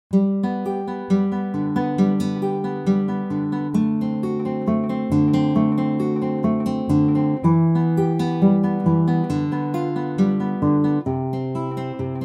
petit prelude sol majeur

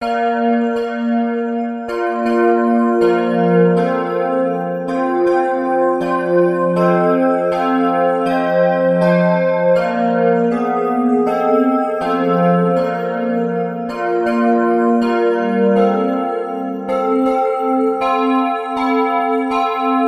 clochettes

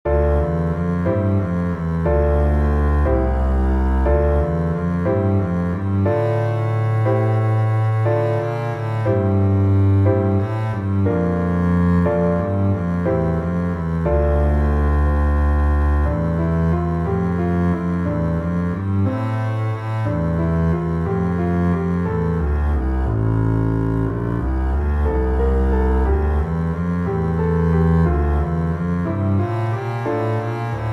pour contrebasse piano

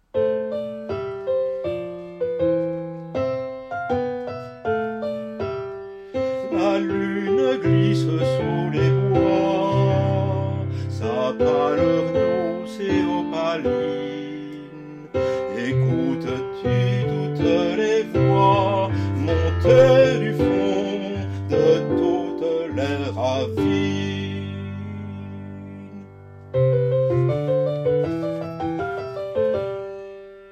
pour voix moyenne piano; Soir païen

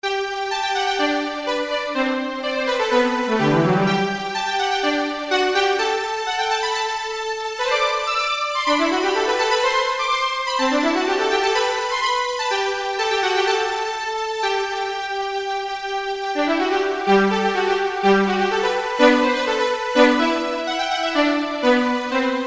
duo violon violon alto; chauveau bruno

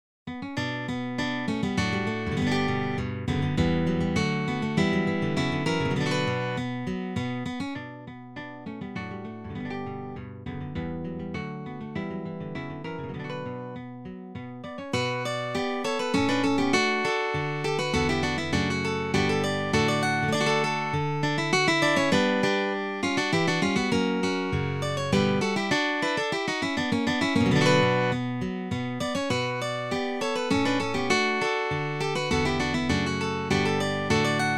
hornpipe en mi mineur; purcell henry

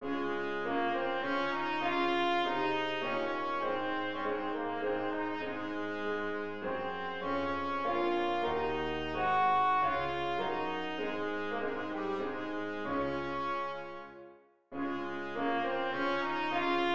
valse ra majeur; beethoven ludwig van

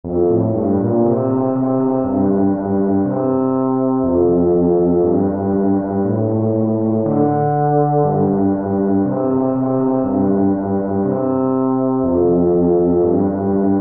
pour tuba

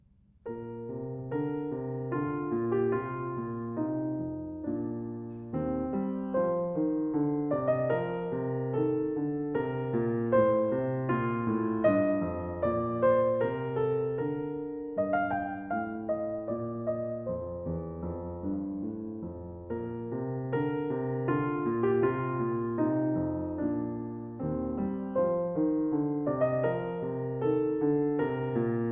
menuet

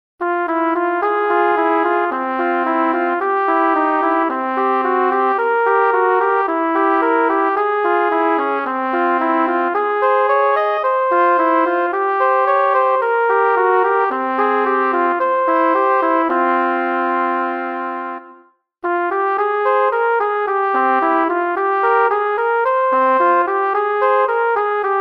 duo oriental en sol

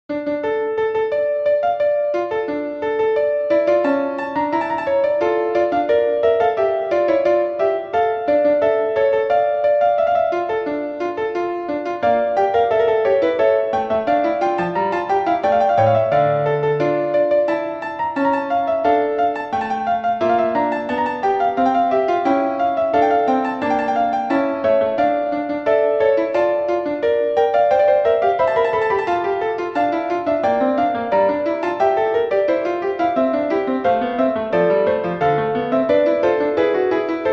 basse trompette, orgue for piano; marchand louis

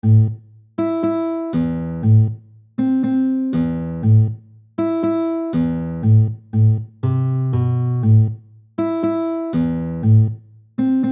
habanera la mineur; pour butant

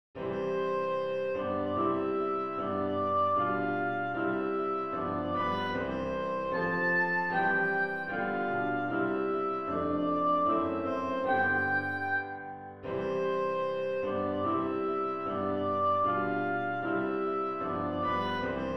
hymne national allemand; haydn joseph

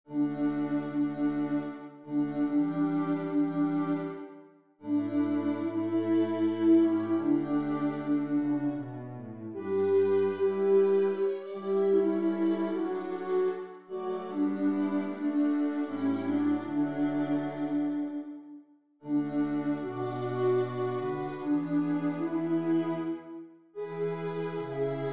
altos cordes

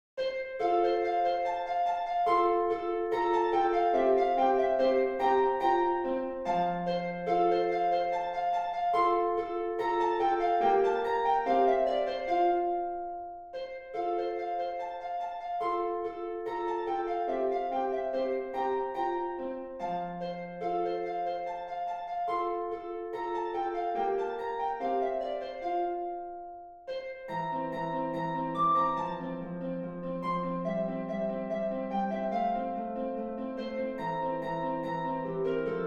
scherzo majeur

